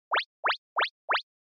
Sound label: Anime Motion Phrase